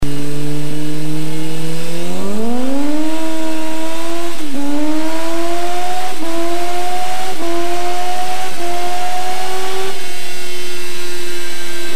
Yamaha R1 akrapovic pipe

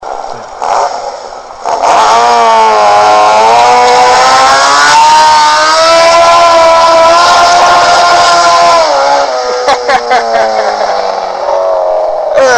Hurricane1000 burnout, motoskanas

